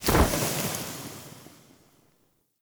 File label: Torch Puzzle Water Extinguish; Puzzles